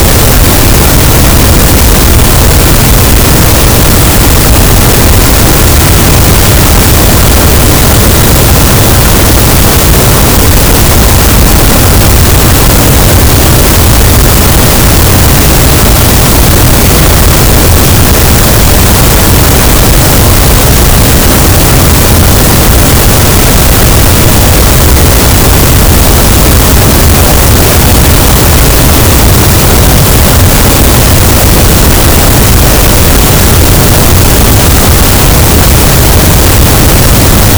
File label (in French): cachalot negatif, gaussian noise